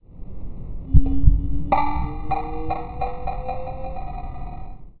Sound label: ball